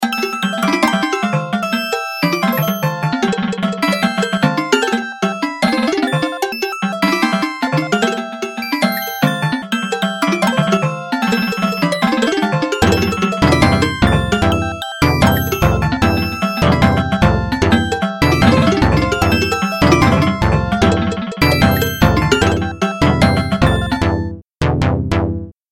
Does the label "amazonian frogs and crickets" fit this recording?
No